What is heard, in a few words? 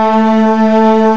LOW STR, LOSTRING; M8; CMI Factory